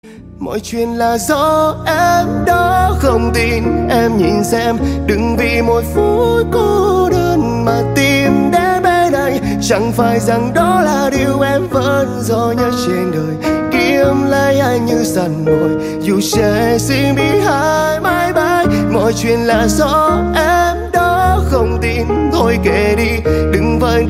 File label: piano version